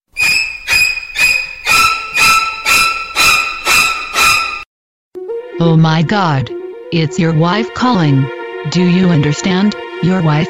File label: funny wife ringtone